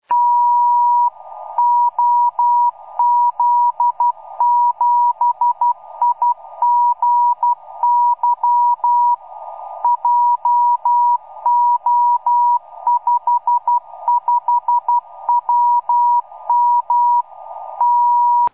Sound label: beacon, 4m; OZ7IGY